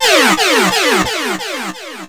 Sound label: Boss Death; mm7sfx